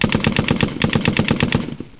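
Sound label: distorted machine gun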